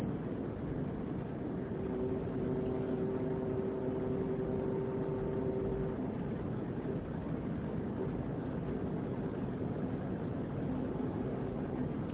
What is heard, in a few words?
Chevy Bolt Odd Noise at Low Power Levels